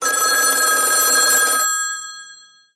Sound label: ring